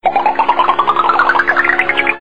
Cartoon Bubbles Sounds Car Horn

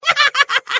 Crazy Laughing Sounds Car Horn

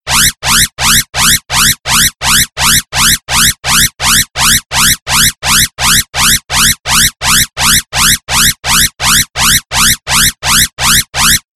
Science Fiction Alarm Sounds Car Horn

